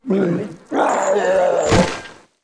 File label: Abomination death